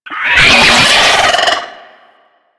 Moa Enemy Spotted, Vocals; Warframe

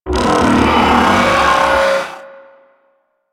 Step Movement; Enemies; Steps; Warframe; Corpus; Arachnoid Camper

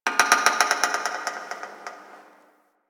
Metal Creak Small; Warframe; Quests; TheNewWar; Ambience; Zariman

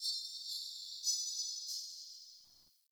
Under City Alley Distant Chains

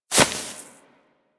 Rodent Hop Step Rear Grass; Characters; Foley; Warframe

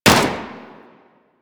Pistol Shot; Weapons; Warframe; Tenno; Guandao

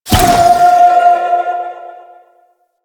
Spirit Primary Fire A; Warframe; Grineer Thumper Bot; Sentient Spirits

